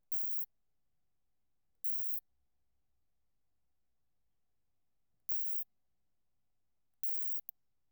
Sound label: Isophya pienensis, Hundsheimerberg, orthoptera; daheim; 28,2Grad